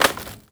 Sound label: Foley